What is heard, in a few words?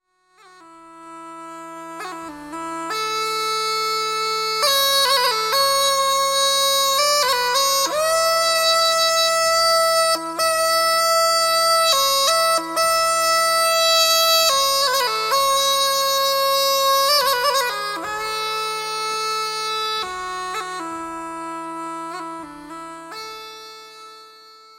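Amazing, säckpipa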